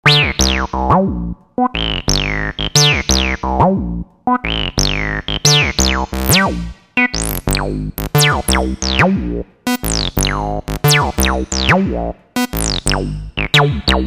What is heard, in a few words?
ringtone